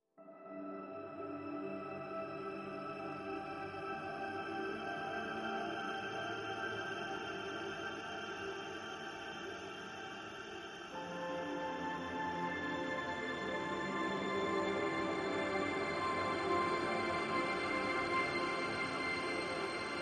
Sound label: Ambient Mix